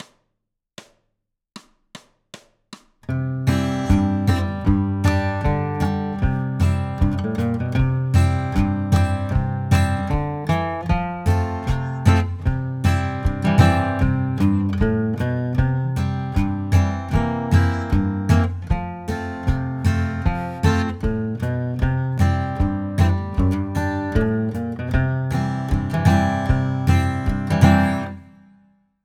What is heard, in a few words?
Dark Hollow rhythm; Bluegrass Guitar; more BG rhythm ideas for C